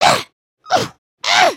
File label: slash ninja caught; emotes